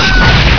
barrel; Doom2; smurf

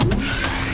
Doom 2 Explosion

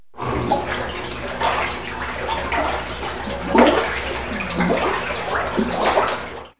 Milk Stirred in Tank; Farm Life

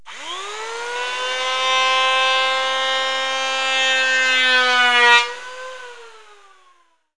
Electric Hand Planer; Construction; KABOOM! - Wild and Wacky Sound Software

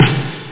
reverb snare

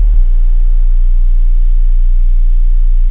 sub base, bass, synth; da capo vol1

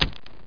wet whap; landslor

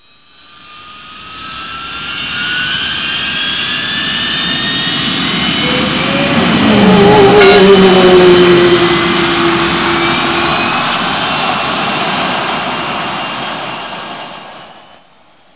jet roar

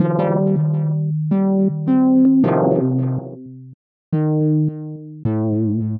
synth, ambient, 160, C; Keys; eJay

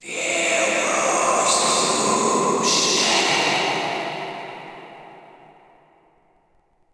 undead; DAoC

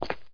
step asphalt; specops

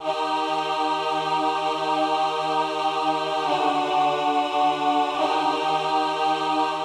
Synt; Evolution 077

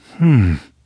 hmm; heretic ii demo; corvus

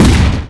EXPLOSML; Shadow

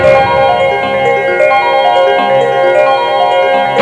bells in; Sound Library 2000; Wayzata Technology; Music In

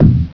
bd; Widget Workshop; A Mad Scientist's Laboratory (1996); Maxis

